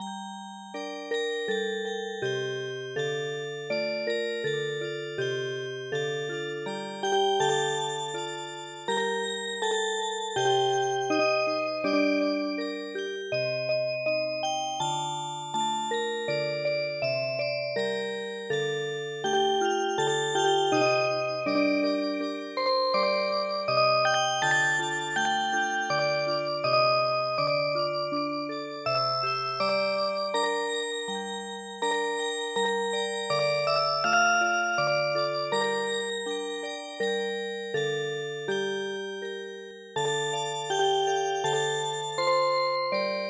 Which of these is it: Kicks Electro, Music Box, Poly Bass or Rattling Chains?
Music Box